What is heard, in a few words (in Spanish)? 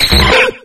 Grito; Actualizado a la sexta generación